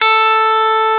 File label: KM GUITAR; E-MU Formula 4000 Series Vol. 3; Analog Odyssey